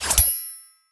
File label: hidden weapon hit shield